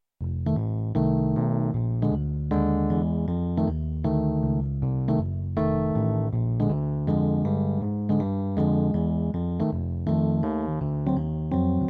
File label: chord